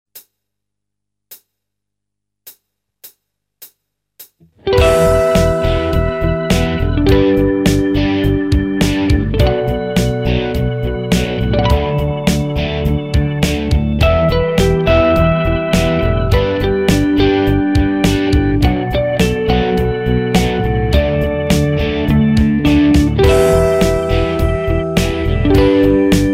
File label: Natural Harmonics, guitar